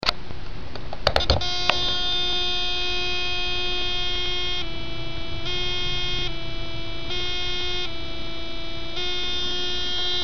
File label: buzzbox